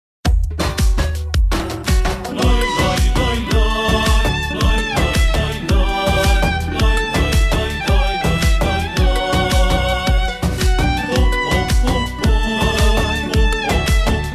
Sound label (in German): Harfe E minor bpm 110